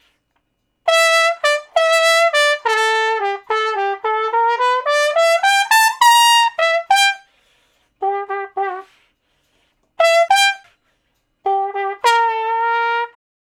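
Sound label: Trumpet Slow Blues (G I, I, IV, IV); Solos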